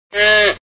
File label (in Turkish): inek, hayvanlar